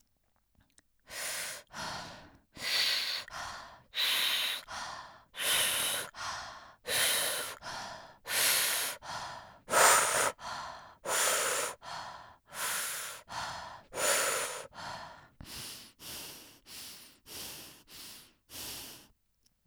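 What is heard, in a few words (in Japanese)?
呼吸音（口をすぼめた呼吸）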